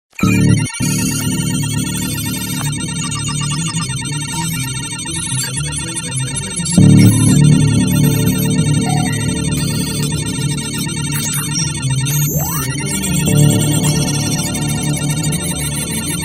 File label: HUD menu